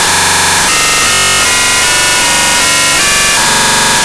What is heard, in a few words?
burst